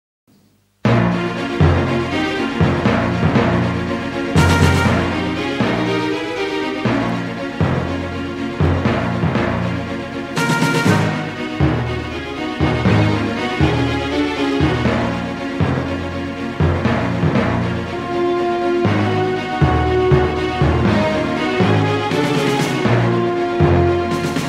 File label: classic uk tv theme; panorama